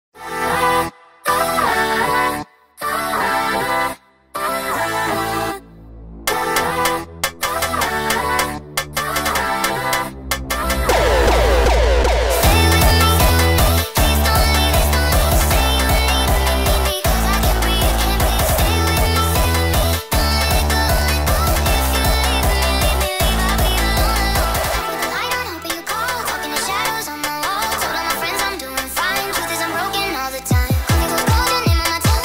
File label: jumpstyle sped up